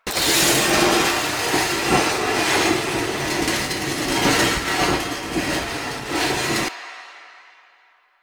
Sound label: Power Slide Boost Ready Loop, Motorcycle; Motorcycle Ninety Nine; Bullet Jump